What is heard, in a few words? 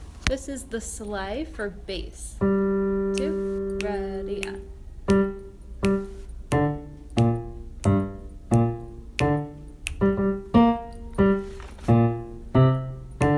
the sleigh bass